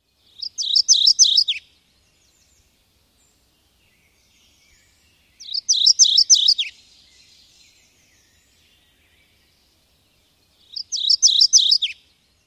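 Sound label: Mourning Warbler, songs number 1; NY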